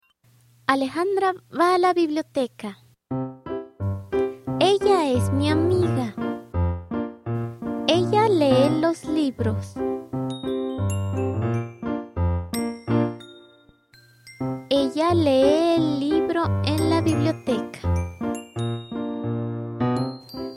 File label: Cántico de la escuela, Spanish Educational Music; School Chant